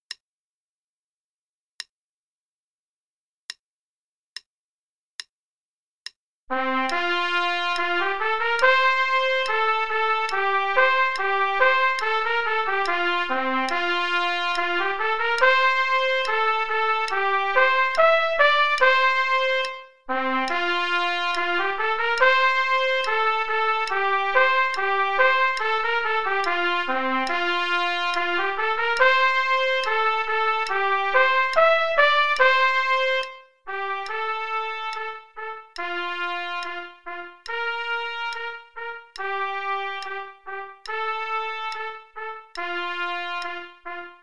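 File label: Play-a-long, quarter note = 72, trumpet; Rubank p.48 Der Freischutz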